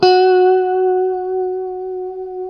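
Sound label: F#JC120; Spectrasonics; Hans Zimmer Guitars VOL-1; JC-STRAT MF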